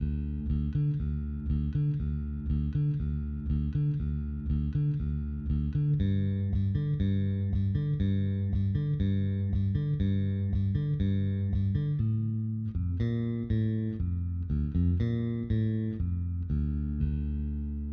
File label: bass